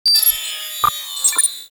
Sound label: Computers Random D; Warframe; Ambience; Grineer; Grn Computer Room 1